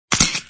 camera

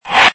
gavel, reverse

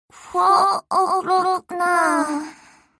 clock

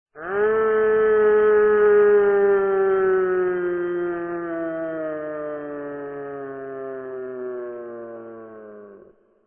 ahh

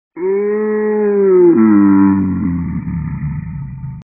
OOOHHH

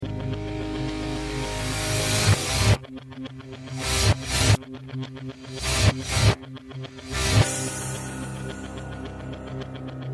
loss; reverse

